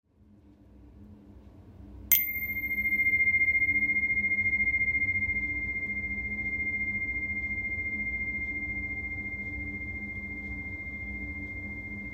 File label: super baion tuner